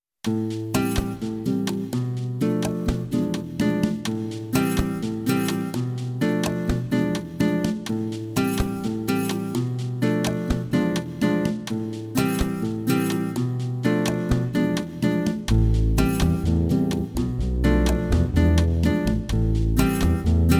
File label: brass minus